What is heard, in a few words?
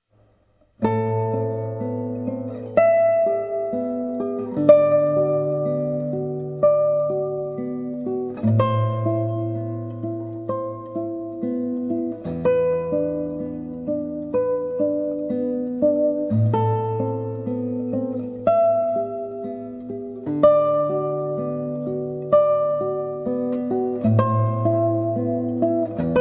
A Sad Song